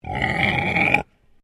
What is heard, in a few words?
Animal Pig Landrace Growl; Alice; Edgar's Mission Farm Victoria; Pigs; Animals